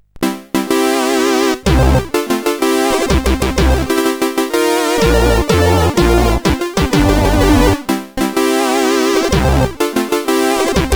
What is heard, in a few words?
Fanfare, sid CSG8580R5